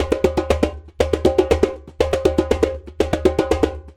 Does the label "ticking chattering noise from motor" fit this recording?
No